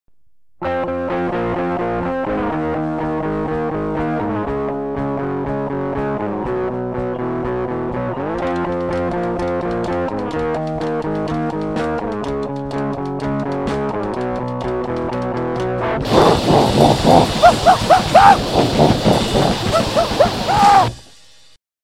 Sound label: Romantic Song